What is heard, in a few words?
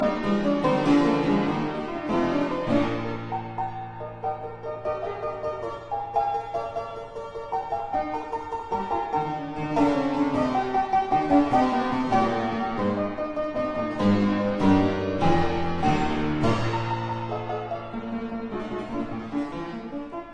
Keyboard Sonata in C minor, Finale Allegro molto